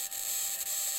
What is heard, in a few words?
mic, mars2020 edlcam